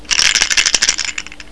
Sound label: SHAKE IT